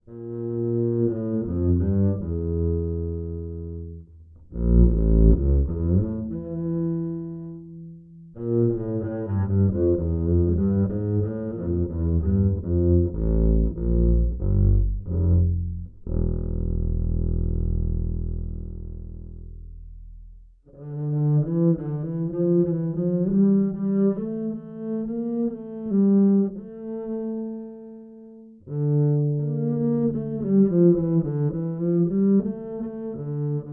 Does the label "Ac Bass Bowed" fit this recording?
Yes